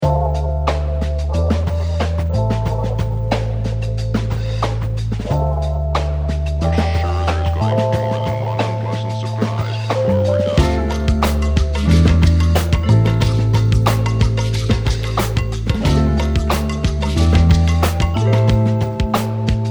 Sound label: Hip Hop Track for Lyric Writing Exercise